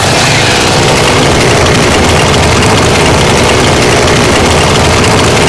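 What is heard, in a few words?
chainsaw idle loop; weapons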